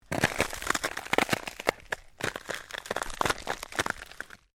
Ice Crackling Stereo, Ice movement, cracking and shifting; Snow (Sound Effects:Weather)